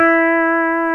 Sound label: PEDAL STEEL